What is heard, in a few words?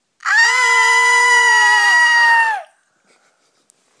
haaaaaaaaaaaaa